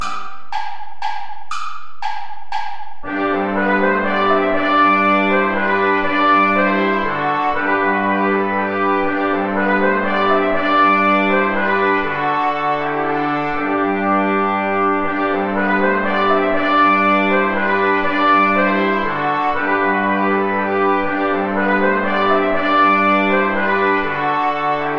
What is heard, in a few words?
saltarello play along without trombone; praetorius michael